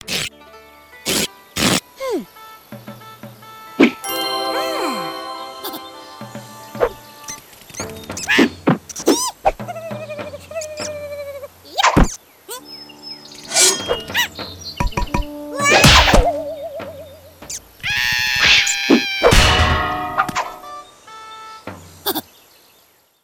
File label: Medieval Bronze; Worms Forts: Under Siege